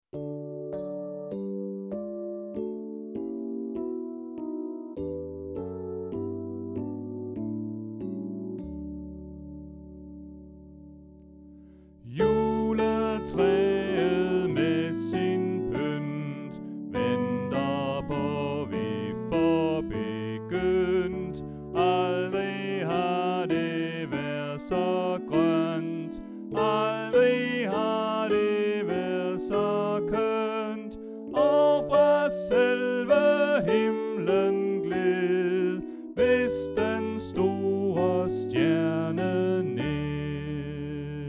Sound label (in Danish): Sopran; oevefiler